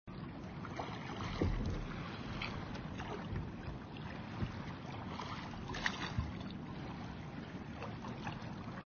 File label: water; papas pastaria to go; android